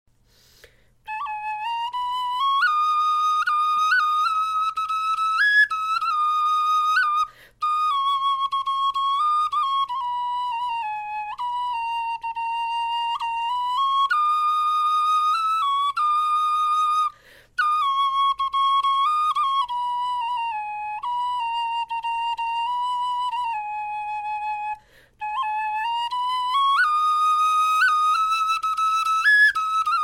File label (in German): melodie